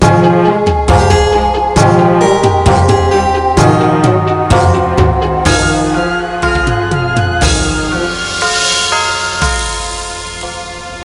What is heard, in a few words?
Lose; FOG